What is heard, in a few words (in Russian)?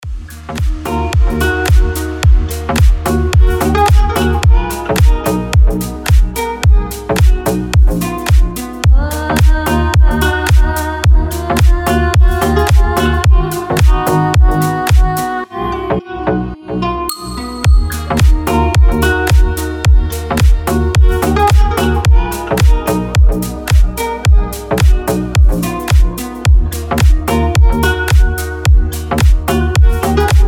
gitarnij relaks